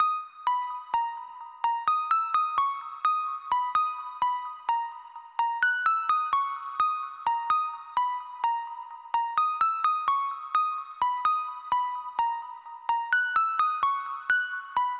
128 BPM G# Min Lead; Loop Stems; Cymatics; Breakdown Loops; Fuse

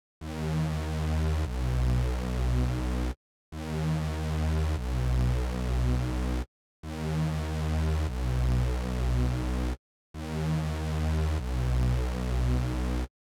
145 BPM D# Min Saw; Loop Stems; Cymatics; 2022 Melody Collection - Wavy; WTF

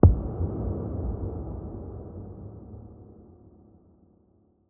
Percussion Hit; One-Shots; Ghosthack; SkyRealm; Percussion Hits

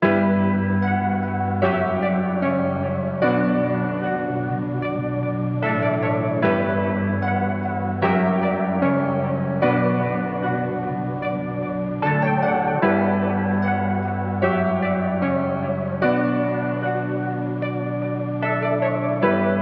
Colossus 150 BPM B Min; Cymatics; 2022 Melody Collection

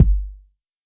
Kick, Lo-fi; One Shots; Ghosthack; Drum Mega Bundle Demo; Hip-Hop & Lofi Drums

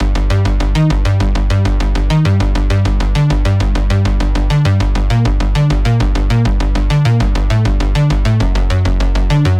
Bass Loop 100 G Retro; Ghosthack

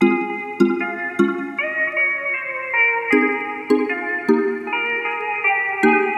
Happy Melody Loop - 155 BPM F Min; Cymatics; Oracle Sample Pack

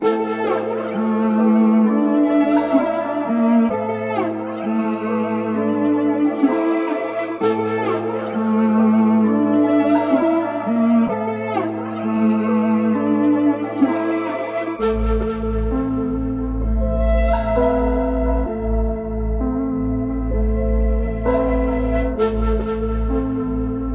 130 BPM A Min, Melodies; Cymatics; 2022 Melody Mystery Expansion

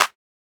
Clap, Hip Hop Drums - One Shots

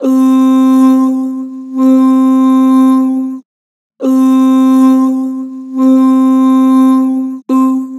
Ooh, Vocals; Harmor

